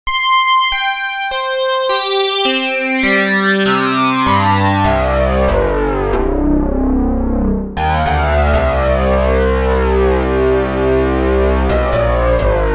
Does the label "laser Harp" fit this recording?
Yes